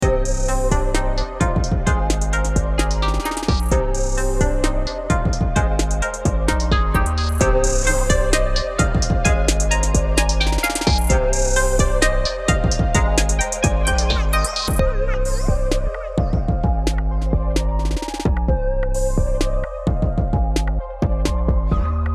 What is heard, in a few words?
japan chill